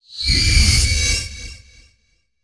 Iron screech and zooming sound

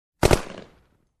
Machine Gun two shots

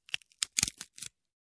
Peeling off

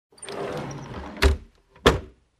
Sliding Door closing

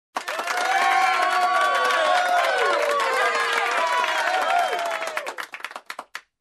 Warm Applause and Cheer

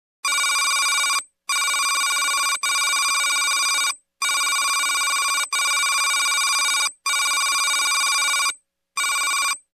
Synthetic Computer Ring

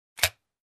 Gun slide snapback

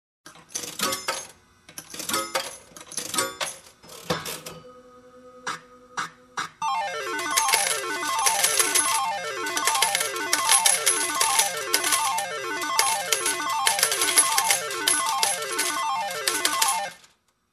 Slot Machine Winner

